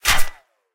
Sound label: bullet hit armor; War Thunder